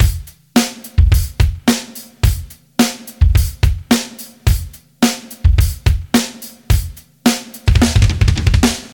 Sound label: 108 bpm breakbeat g sharp key, Free breakbeat - kick tuned to the G# note. Loudest frequency: 1594Hz; drum loops